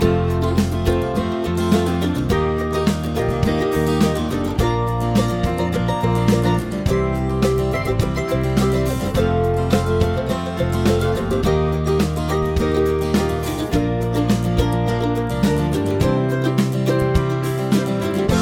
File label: chord prog with mixture